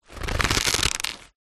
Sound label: foley paper sheets lots twist squeeze; zapsplat